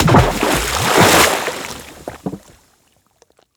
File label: water splash; Bluezone Corporation; Water Sound Effects; Steampunk